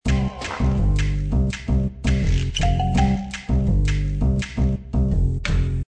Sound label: music; Shopmania